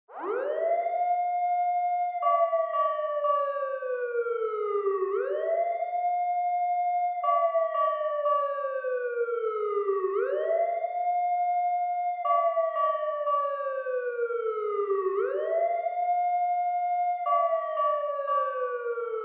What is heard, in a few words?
Fire Truck Siren Far